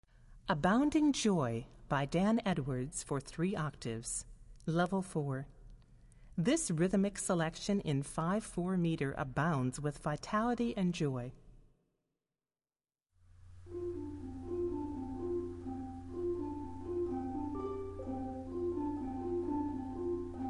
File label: Handbells; Publisher: Chorister's Guild